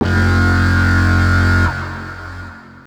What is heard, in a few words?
hatch alarm